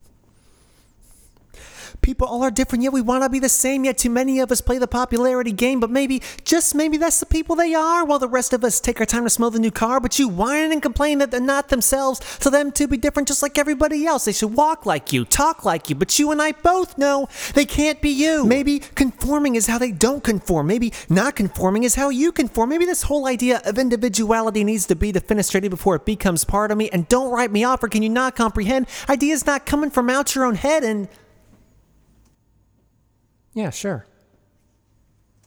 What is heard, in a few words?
verse lead